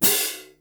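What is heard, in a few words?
cymbal orchestral mute; 300 Drum Machines; Yamaha Motif ES-Series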